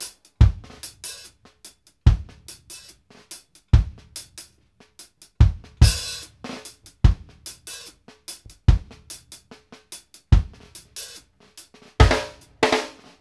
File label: KICK, REGGAE 72; Sampleheads; New York City Drumworks VOL-1